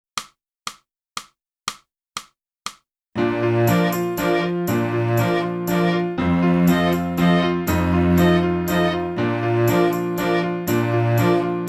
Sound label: flute; boosey